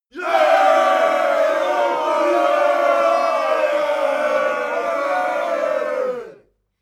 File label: battle cry